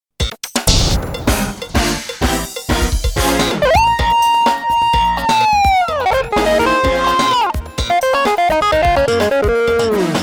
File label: General Music S3 Factory